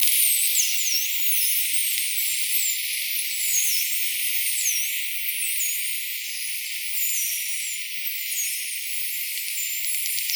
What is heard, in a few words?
Atlantic White-Sided Dolphin